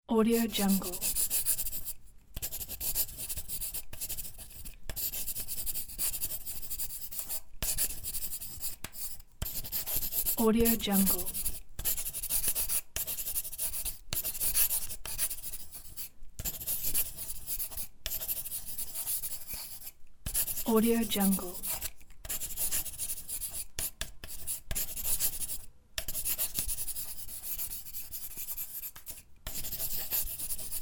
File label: writing with pencil; soundfxpro